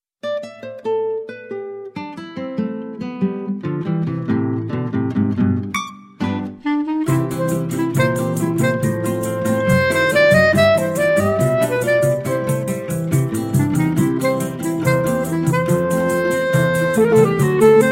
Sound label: choro meets ragtime